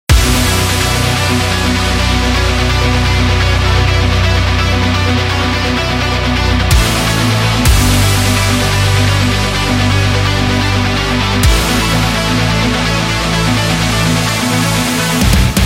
Rock Metal, Powerful and aggressive background music for action videos